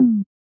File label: bet Clear; baccarat2